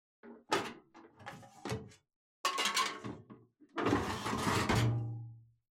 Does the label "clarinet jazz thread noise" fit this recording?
No